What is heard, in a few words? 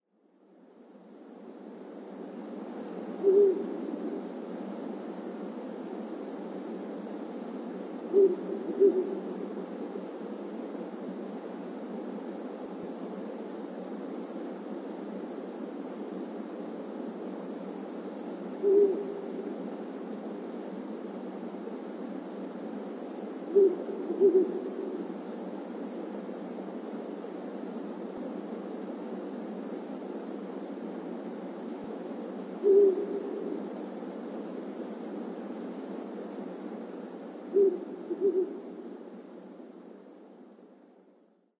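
Ural Owl, uryu; koe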